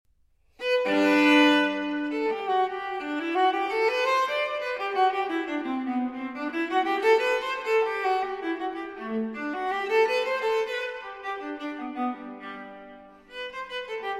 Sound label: Cello Suite No. 1 in G Major, for Viola, Allemande; J.S. Bach; BWV 1007